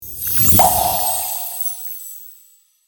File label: Magical cartoon pop